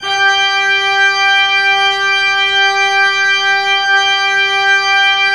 Orgue Eglise Full G5, Pipe Organ Full

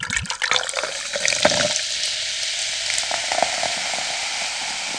limonade, BAR AND PUB; East West; Global Sounds

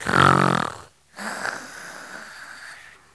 RONFLE; East West; Global Sounds

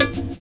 LA CLOCHE; Univers Sons

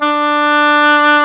HAUTBOIS; East West; Global Sounds

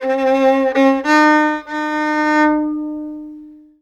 VIOLON, ArabianTraditions; USB Soundscan vol.43; AKAI; VIOLRIFF1

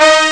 cuivre section; Amiga Soundtracker Sample Packs

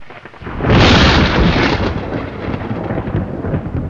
TONNERE; East West - Global Sounds; SPATIAL

